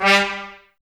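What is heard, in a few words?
Brass trumpets G, Mute; Miroslav Vitous - Woodwind Brass Ensemble; Tps Mute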